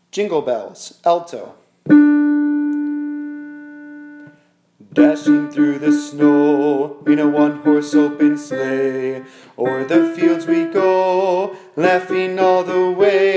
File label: alto jingle bells